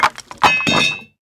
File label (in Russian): metallicheskij skrezhet tyazhelogo metallicheskogo oruzhiya